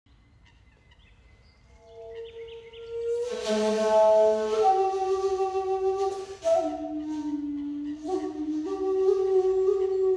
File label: Bamboo flute